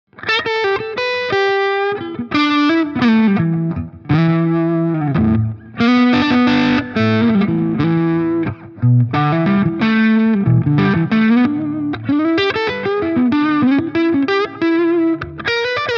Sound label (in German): Supro Delta King 8 Combo Gain max. Boost on Halspickup